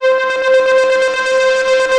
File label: Synthesizer Middle C Notes, Lead; instruments multisampled; synth keys